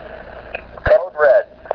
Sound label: code red, computers